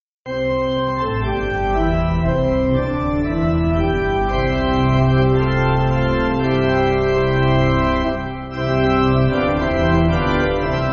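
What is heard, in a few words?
Pipe Organ, Music Backing Files for Churches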